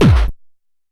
Kick Hi, Stylophone Beatbox

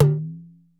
SFX AFRO, ETHNIC PER

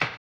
HAMBONE; Blues & Soul

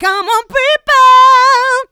Female Vocals; Houseworx

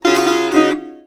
SITAR GRV, World Colours; Best Service

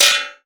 SOUND EFFECT, Metal; AKAI S6000 CD-ROM - Volume 1

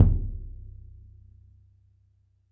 Roland TD-20 Percussion

